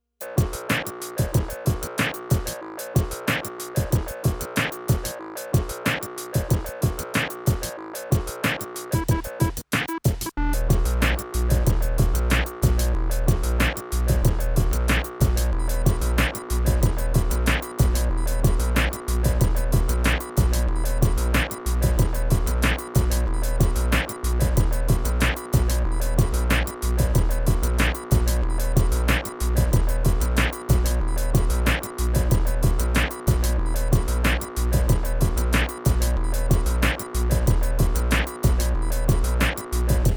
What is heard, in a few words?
skotch beat